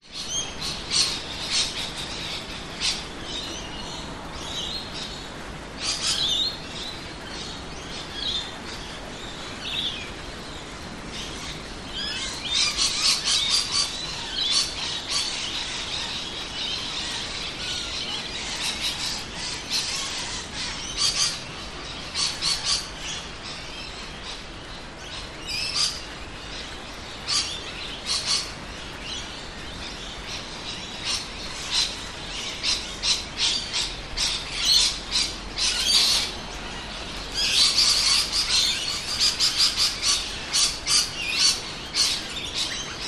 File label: public places suburban rain birds lorikeets noisy minors traffic few distant; qld australia